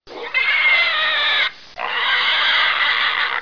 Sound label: Pig Squealing